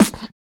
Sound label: Human Perc, Body Perc